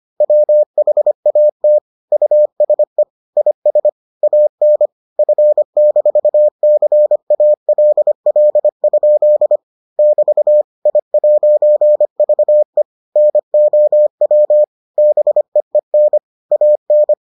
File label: morse